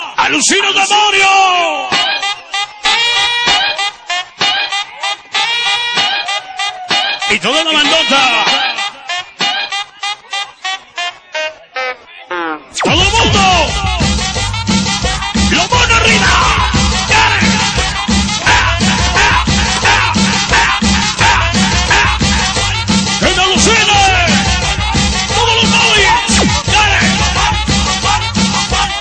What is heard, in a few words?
Cumbia; Amores Perros